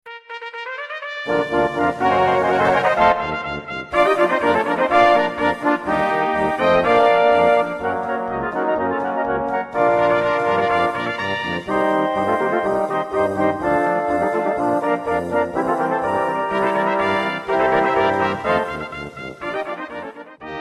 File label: POLKA